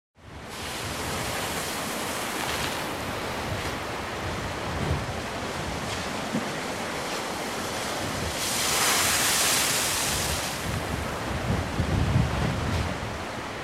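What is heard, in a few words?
sea sound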